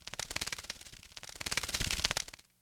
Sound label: Bird Fly By